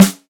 Smooth Bottom End Snare Drum G Key, snare one shot tuned to the G note; snare drum samples